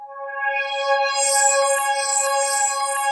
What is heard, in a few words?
PPG TABLE C5 L, Analog; USB Soundscan vol.49; AKAI